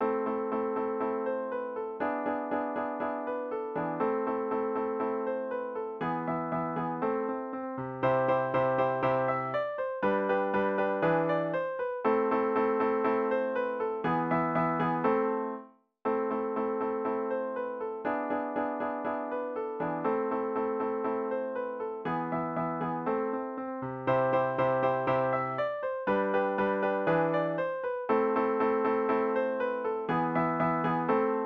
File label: russian folk song piano